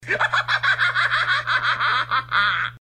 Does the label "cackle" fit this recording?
Yes